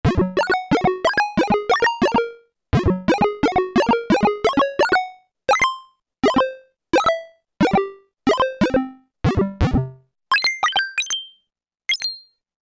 P S G, Korg MSC-1S; Korg MSC-1S Variety

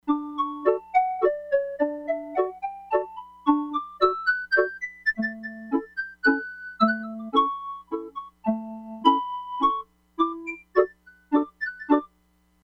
PIPE FLUTE, Crumar Bit 99; Factory Programs

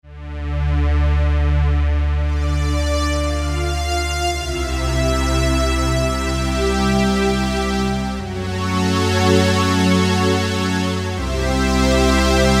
Korg microKORG Octave Strings; Factory Voices

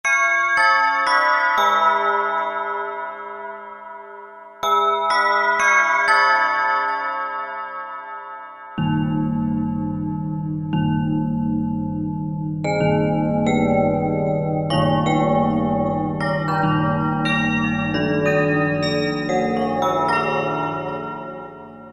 Clock Tower; Factory Preset examples

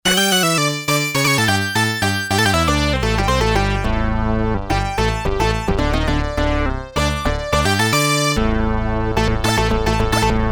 Stack example, Sequential Circuits Six-Trak

